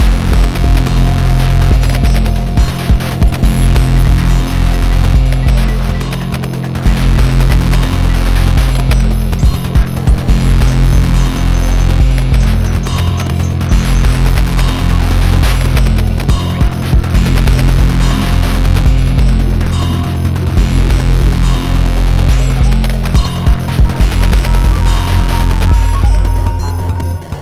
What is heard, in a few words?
Glitch sonata Medium; rive wreck hack die retry; ps4